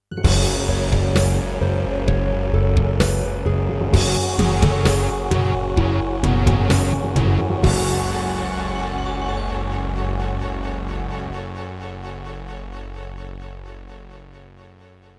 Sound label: Roland SC-88; Doom (Sigil); Introduction